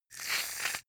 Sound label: shaving cream dispense